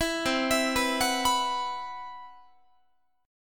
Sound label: C Major Sharp 11th, Guitar; CM#11 arpeggiated